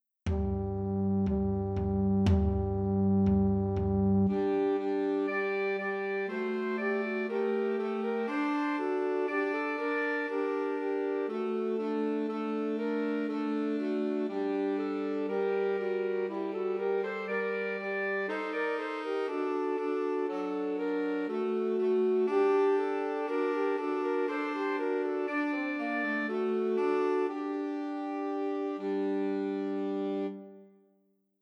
Two Altos and Tenor Saxophone; Christmas Trios

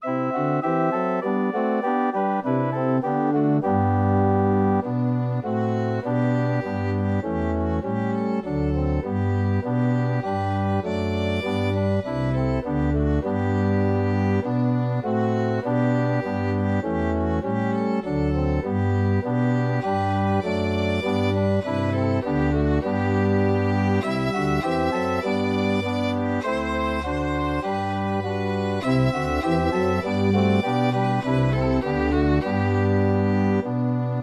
Violin Track with Melody

